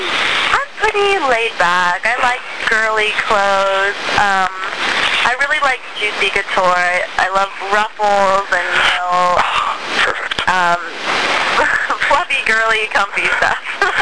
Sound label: ruffles